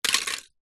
leisure toy car metal drop onto small pile of others